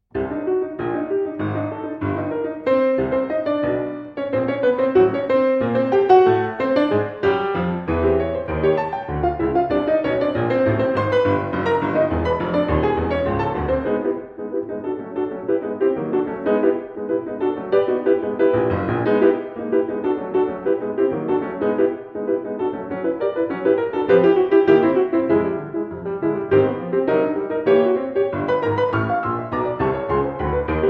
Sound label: Variations for Piano Allegro Vivace